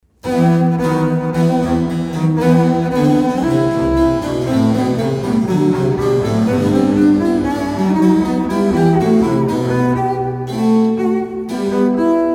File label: Triosonate; GF Haendel; Satz2